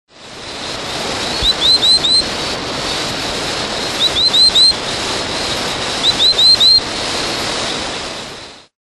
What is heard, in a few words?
Boophis englaenderi